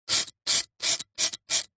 stone or flat rock knife blade 5x scrapes scratches; foley; zapsplat